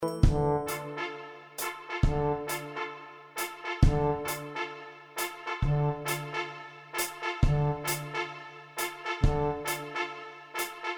Trompette percussions Tuba

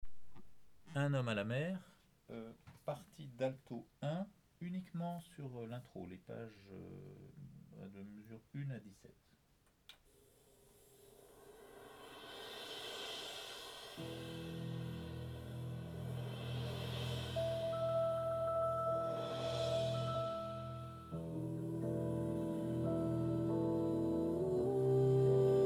Intro Alto 2; Pinocchio

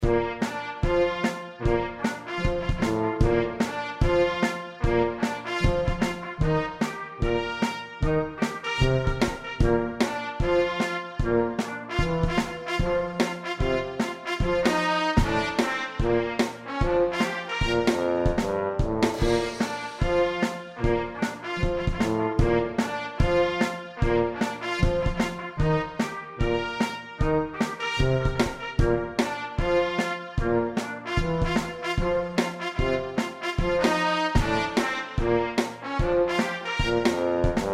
trompette tuba batterie

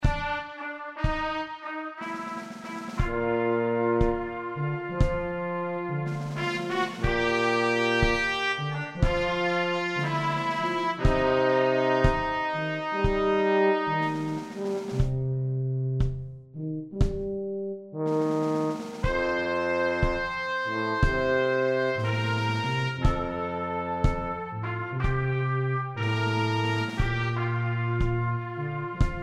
tuba batterie trompette

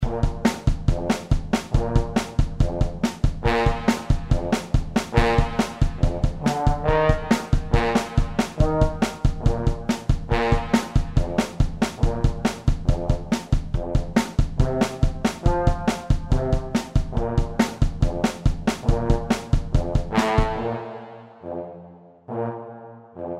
tuba basse blues

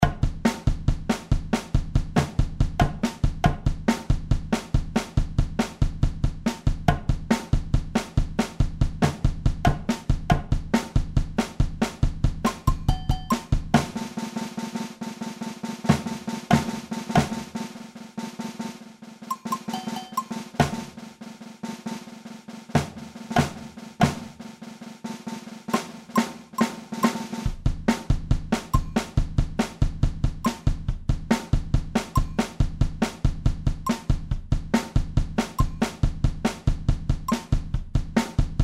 Blues Batucada batterie

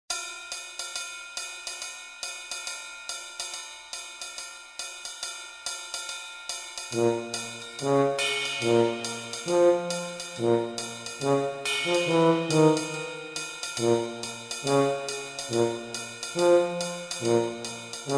orchestre

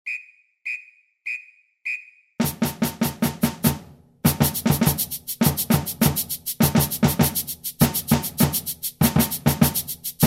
caisse claire

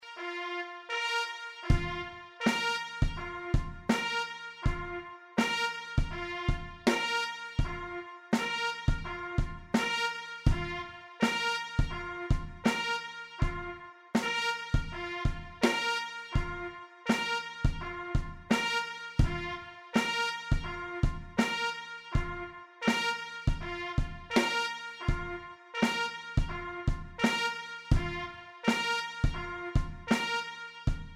rue du bruit trompette partie

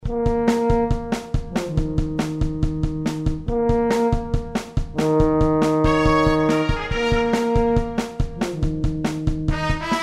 trompette batterie tuba

